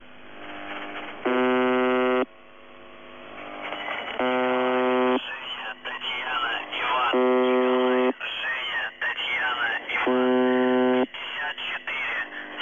Buzzer, numbers stations

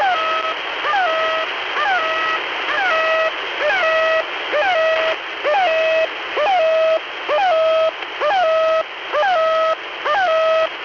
Channel Marker, 3012.00 usb; The Goose

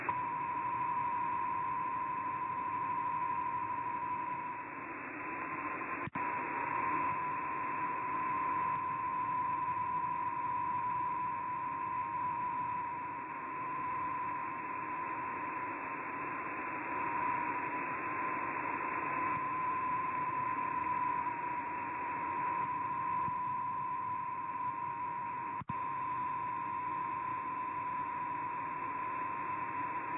4356kHz USB

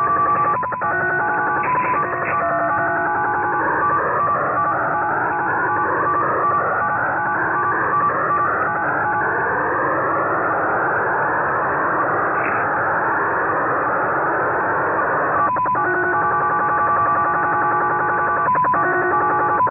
Japanese Slot Machine Traffic

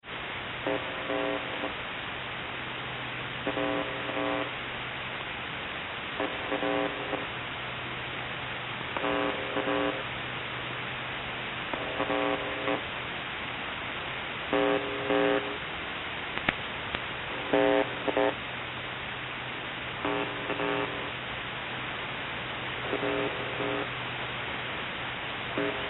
buzzer problem, numbers stations

